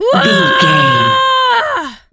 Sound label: voice female battle shout